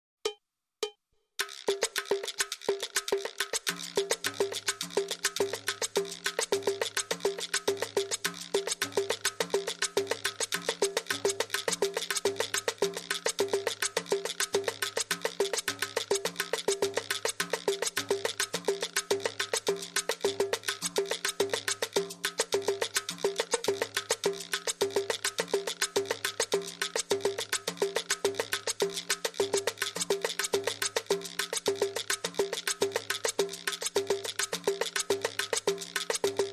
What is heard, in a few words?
Samba Loop Hand Percussion Only